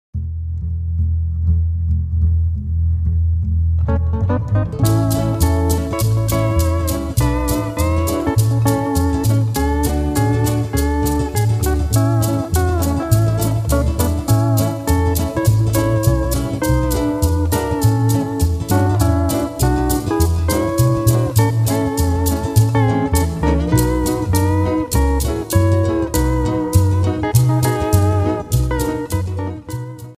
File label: steel